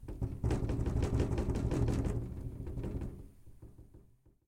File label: Rattling Window; SyrinScape; City gone mad